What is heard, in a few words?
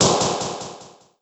teleport appear; opensurge